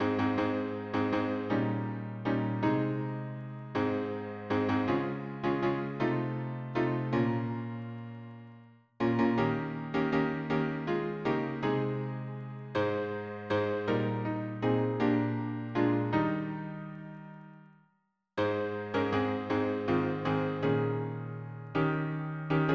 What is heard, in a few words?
harmony piano